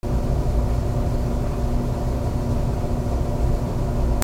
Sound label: HOUSE